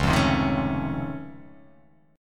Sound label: C sharp Augmented Major 9th down, guitar chord